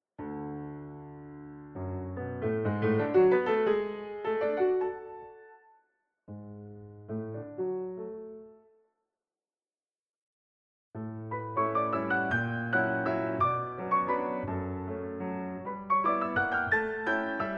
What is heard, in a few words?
piano; Schumann; Introduction et pièces numéro 1 et 2